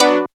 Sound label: SYN, dance synth